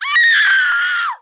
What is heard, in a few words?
female death; Emperor - Battle for Dune